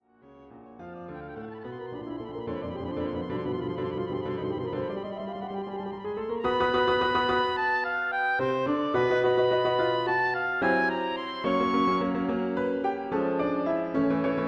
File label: Oboe Sonata